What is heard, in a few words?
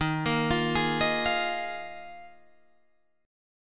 D sharp Suspended 2nd Suspended 4th D sharp Guitar Drop D